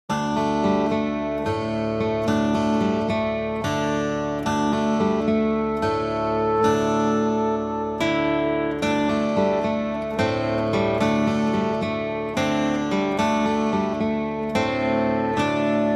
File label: No Drums No Bass